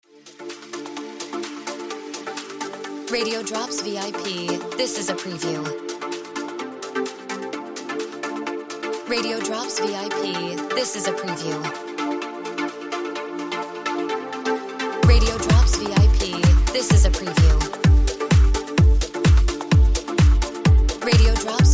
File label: Music Beds